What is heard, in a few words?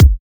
Kick Bassy